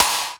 Clap Sustain, One Shots Drums; Ableton Live 12 Lite; Core Library

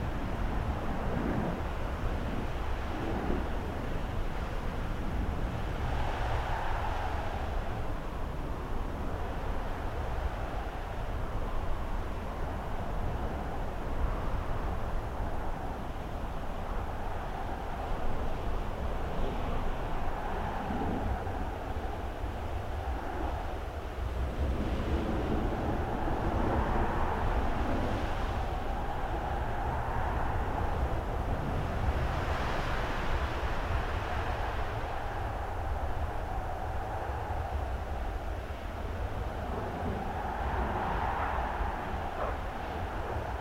Summit Flying Ambience Quad Loop; Journey

